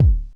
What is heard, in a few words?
kicks, Bass Drum